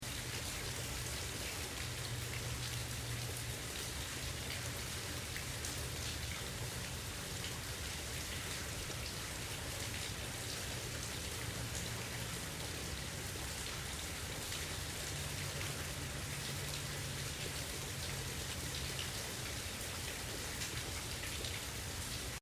Medium Rain Loop, Roof run off, rain gutter, distant city ambience; Seamlessly looping sound; LYN105 package of City Ambience; Rain Sound Effects; LynneMusic